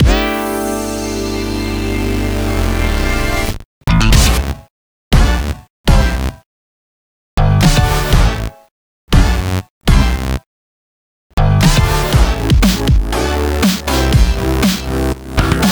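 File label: Bass LOOP; Radio Squid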